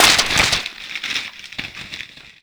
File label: paper bullet impact; Rust